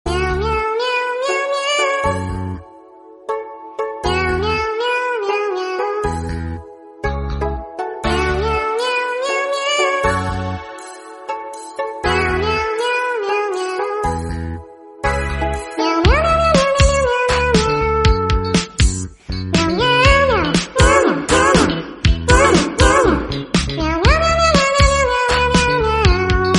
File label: meow version, korean